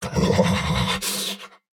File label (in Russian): Заморозка; Турбосвин